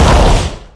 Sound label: Burst; Nickelodeon Super Brawl Universe; Android